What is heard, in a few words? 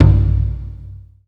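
Malaysian PERCussion G1; USB Soundscan vol.60; Asian Traditions; AKAI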